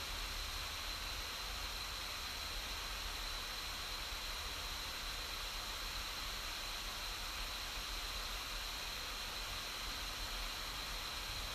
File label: cooler pad